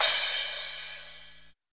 Royality free crash cymbal sound sample tuned to the D# note. Loudest frequency: 7709Hz; D sharp key; drum sample; single hit; one shot